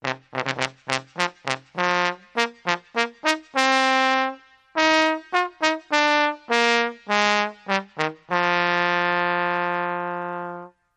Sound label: Trombone Fanfare